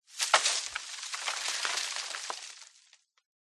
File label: foley debris dirt dust fall drop collapse